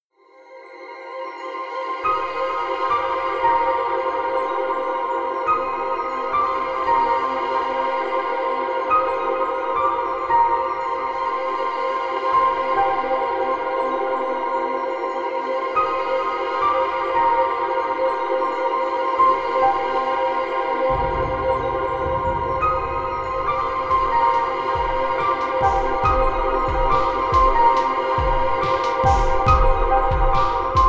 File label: beat